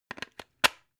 plug plug in clatter

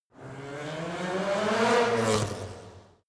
racing car f1 drive by short

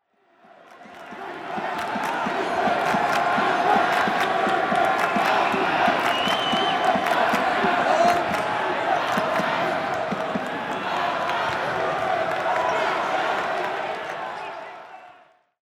cheers applause

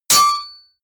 sword hit metal

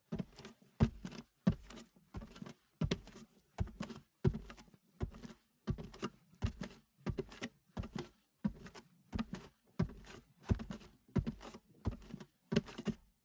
step barefoot metal walk sequence

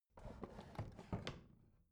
bathroom drawer close rattle

